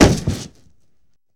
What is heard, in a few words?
Body Falls on a Wood surface